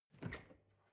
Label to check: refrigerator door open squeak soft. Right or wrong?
Right